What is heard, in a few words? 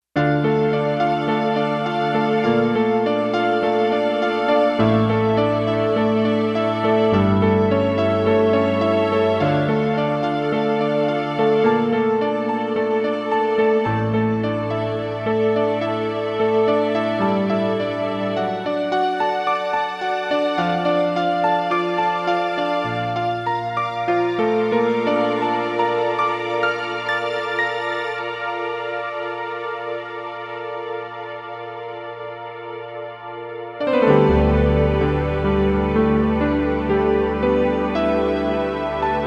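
Soft Piano Strings; Digital Synthesizer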